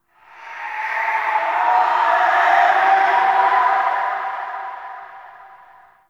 Death Wind Gust High; Ambience; One Offs; Red Night

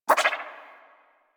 Resonantor Move; Augment; Warframe; Bard

